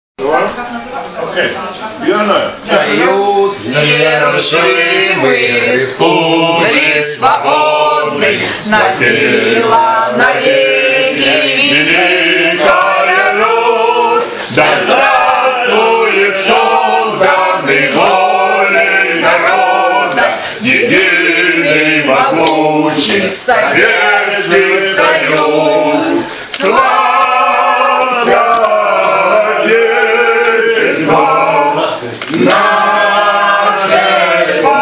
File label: the russian national anthem sung in barga vecchia